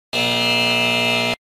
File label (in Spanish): fallo